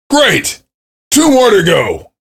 cheer; svencoop; voice commands; SeriousSam

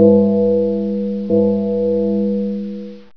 bell, church

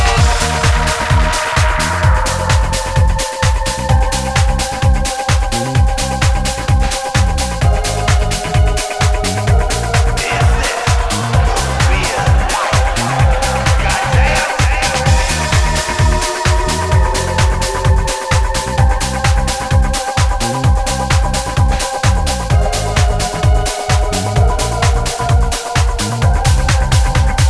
disco music; chaoscity

